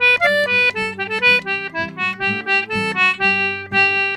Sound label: G C2, Celtic Flavours; USB Soundscan vol.42; AKAI